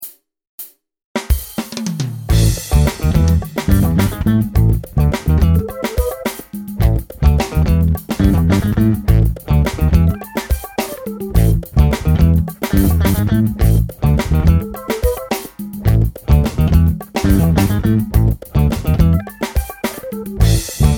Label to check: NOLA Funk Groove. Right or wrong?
Right